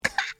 khah quiet

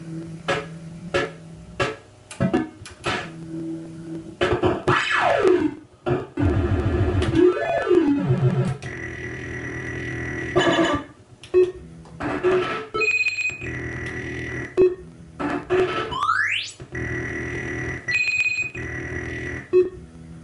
classic slotmachine

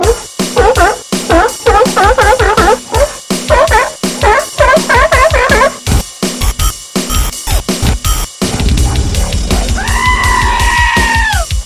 seal metal wub goat, hardcore seal dubstep goat

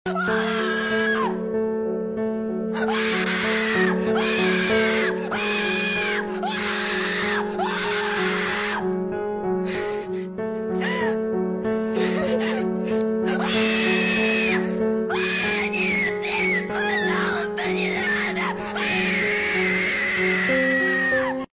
kid cry; granny

